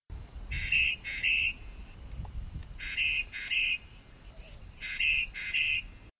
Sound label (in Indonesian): suara finch vampir